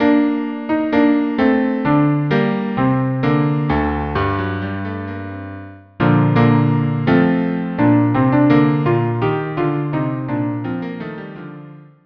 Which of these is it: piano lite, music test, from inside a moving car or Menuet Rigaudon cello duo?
Menuet Rigaudon cello duo